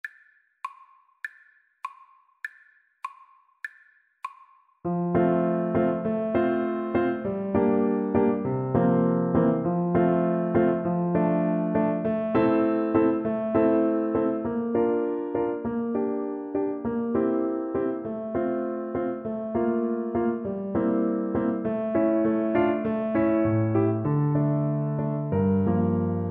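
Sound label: karaoke, Viola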